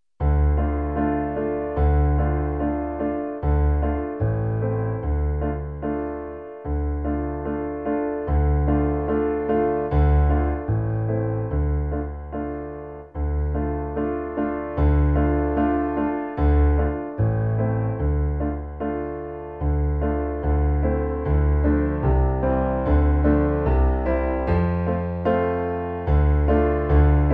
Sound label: Come and sing together PIANO; musica I ESO 2015